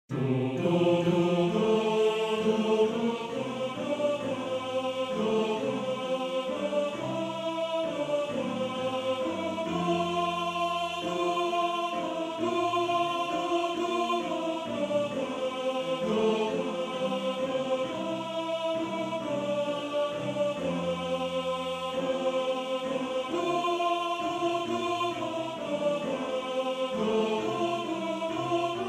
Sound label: Tenor; Heimetvogel; Tenor 1